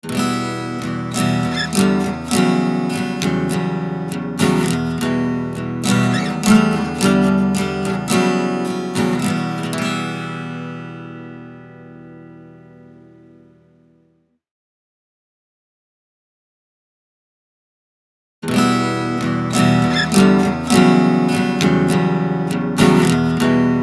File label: Ac Gtr Short Plate; Mullard